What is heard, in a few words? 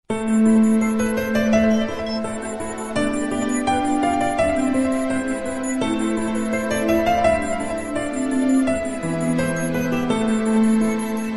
Romanian Piano